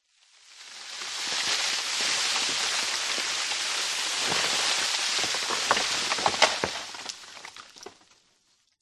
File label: fine debris sand slide fall; foley